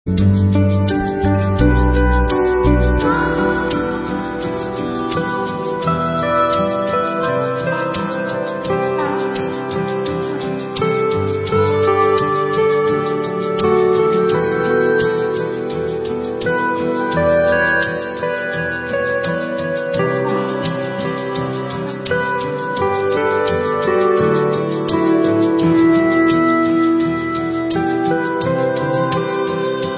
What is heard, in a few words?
Campfire Cowboys; Commando Hi Score